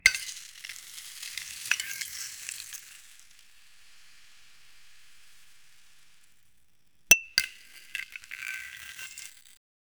hyper realistic glass

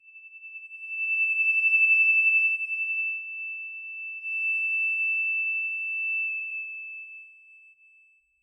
glass

